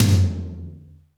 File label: AMB T, HALL KITS; Crypton - DrumBase5000